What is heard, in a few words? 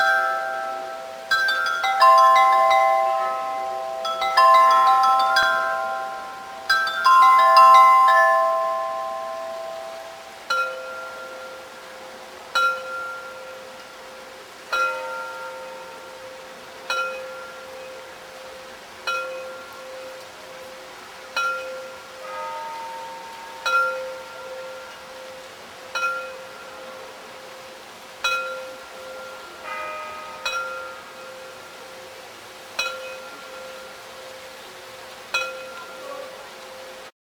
Glockenspiel Dresdner Zwinger twelve o clock